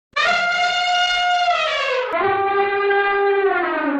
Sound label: elephant sound; audio meme